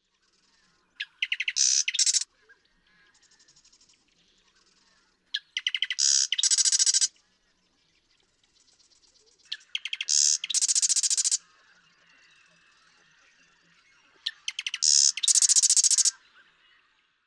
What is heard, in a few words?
Marsh Wren, birdsong